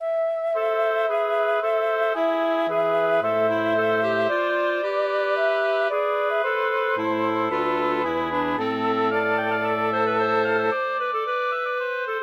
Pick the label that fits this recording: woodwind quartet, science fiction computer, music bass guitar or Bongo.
woodwind quartet